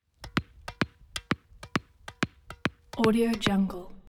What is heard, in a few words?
ext tennis ball dribble; collanderson